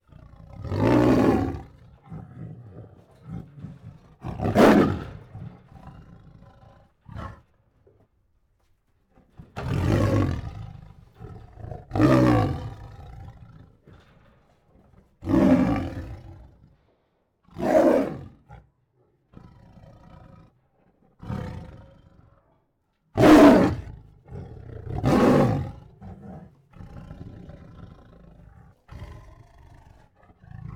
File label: Aggressive and Angry Roars and Snarls, Tigers; Sound Ideas